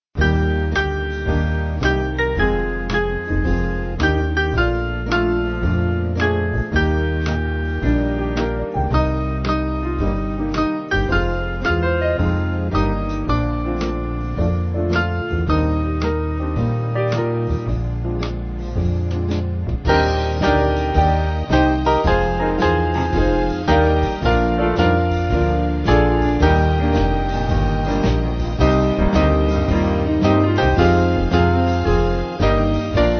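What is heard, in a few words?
Band, Music Backing; We Sing Of Your; Latin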